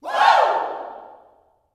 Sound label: "Woo", Vocal Gospel yell shout; Spectrasonics; Vocal Planet Vol.1 Gospel; akai